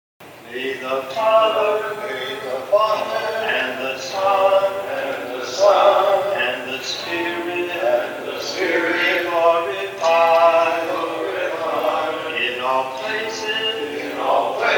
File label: Doxology echo; Marianist